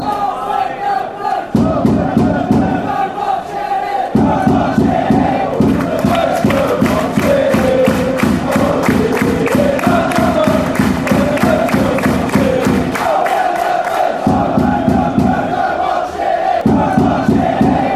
when the blues go marching in, fanchants

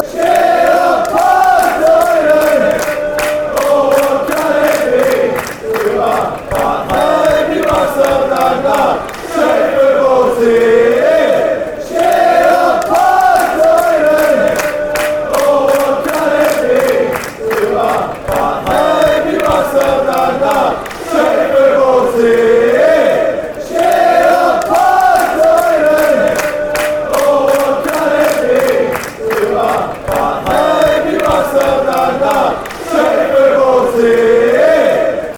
cheer, fanchants; paatelainen

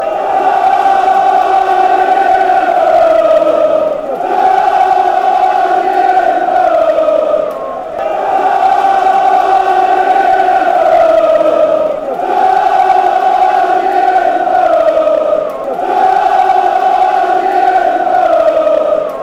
come you pool, fanchants

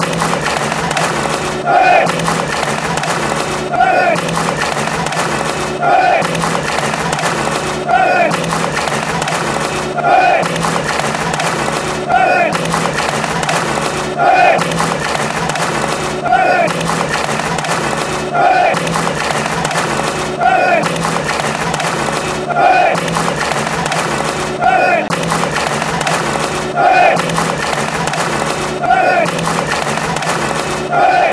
clap, chants

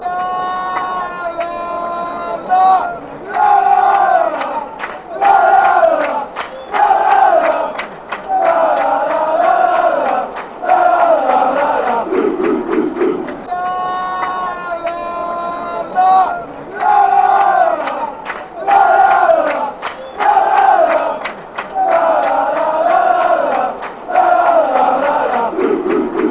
la la lalala blue blues, fanchants

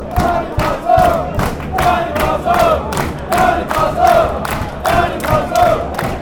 york chant, fanchants

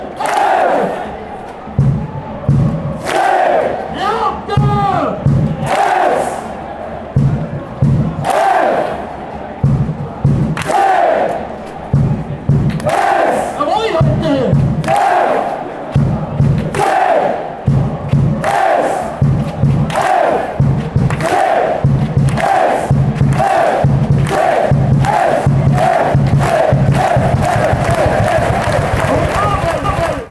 spell it, fanchants

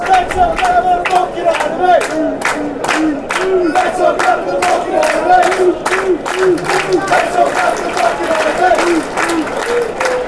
lets all clap, chants

